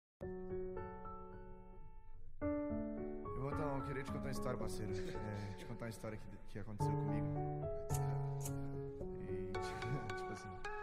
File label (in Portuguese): graves bass